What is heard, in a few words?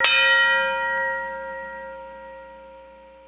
gong